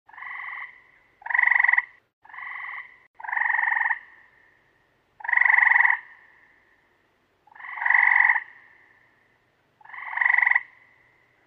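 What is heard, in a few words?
sound of frog croak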